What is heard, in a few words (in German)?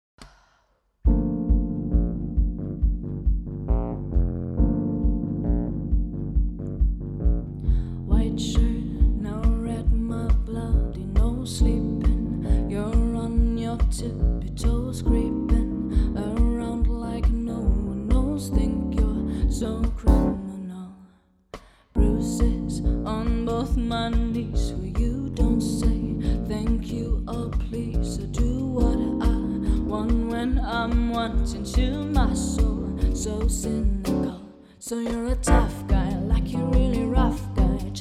Partyband Piano Drums Gesang Band; Hochzeit; Hannover; Empfang